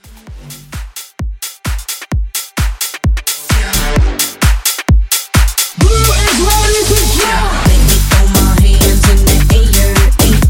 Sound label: Club House 130